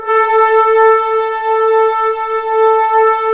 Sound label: orchestra A3 PP; Sound On Sound - Peter Siedleczek's Orchestra